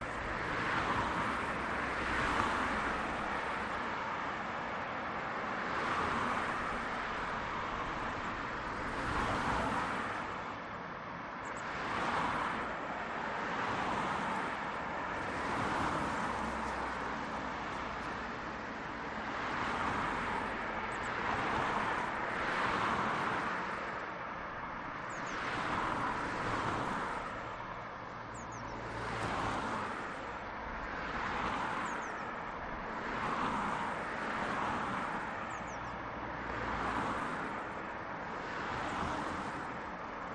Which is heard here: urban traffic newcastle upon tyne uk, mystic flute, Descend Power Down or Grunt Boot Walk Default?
urban traffic newcastle upon tyne uk